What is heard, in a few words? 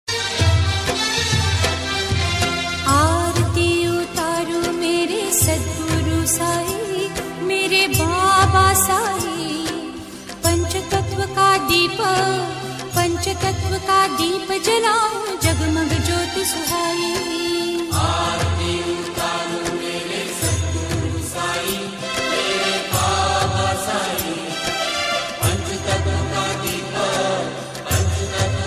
sai aarti ringtone; saibaba